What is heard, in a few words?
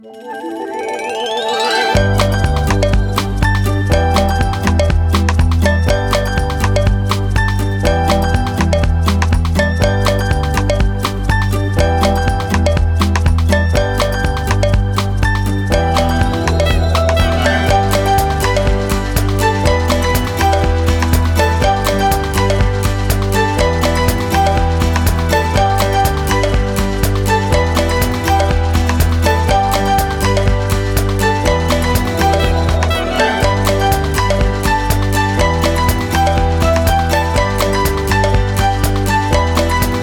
Children Comedy; makesound; rockpop